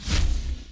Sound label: MOVE; C64 Dreams; Multi-Sound Default